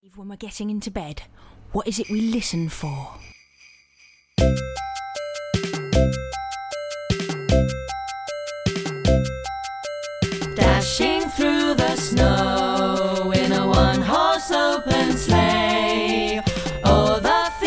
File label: Jingle Bells